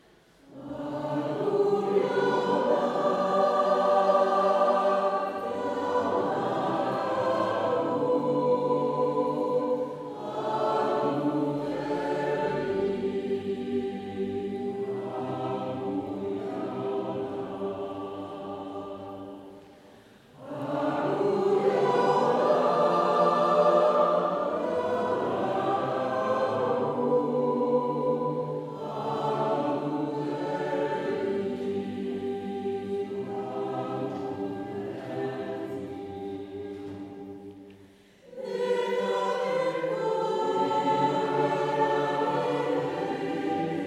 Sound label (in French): Chorale